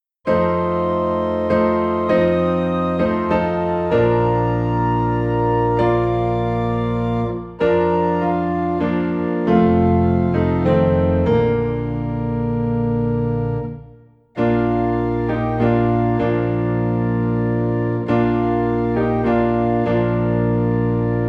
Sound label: Piano and Organ in B Flat; christmas; Silent Night